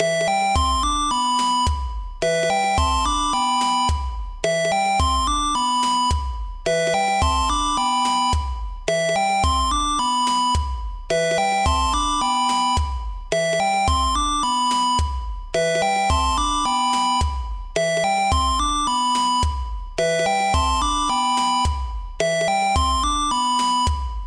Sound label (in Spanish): Alarma beat